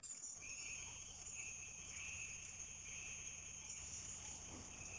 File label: jungle